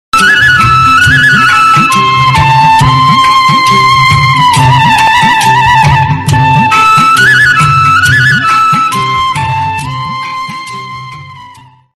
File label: old bgm music ringtone